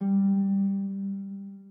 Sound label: harp